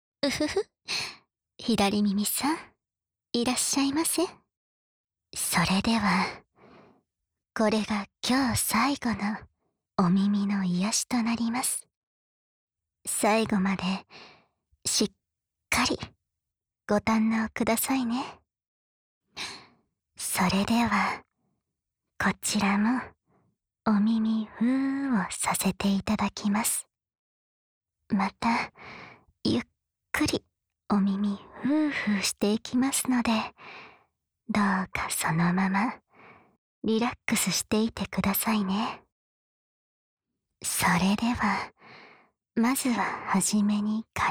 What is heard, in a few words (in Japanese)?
艶麗な 本気の左のお耳ふー, ASMR; 艶麗な店主、紅葉